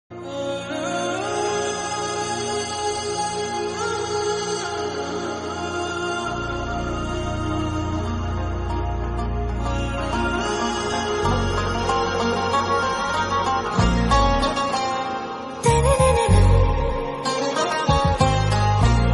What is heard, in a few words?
kushi movie bgm